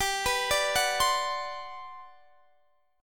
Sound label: G Major 11th